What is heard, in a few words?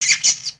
Pet Bunny Beg; creatures pets; Lego